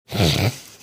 snorting

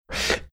death rattle

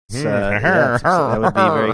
laughing funny

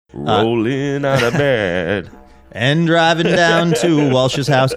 singing rollin out of bed

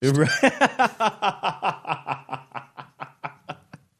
awesome laugh

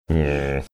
making grumble; marge simpson like

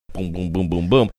boom boom boom boom boom

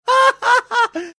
cute laugh

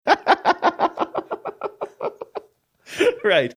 laughing saying right

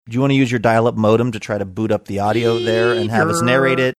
making dial up sounds; saying do you want to dial up modem

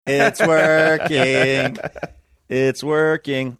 singing

